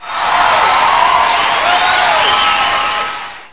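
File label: crowd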